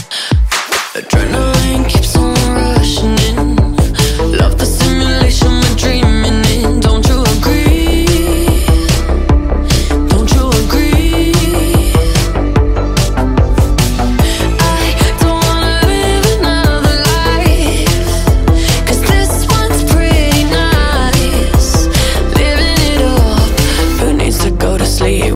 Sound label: ringtone english ringtones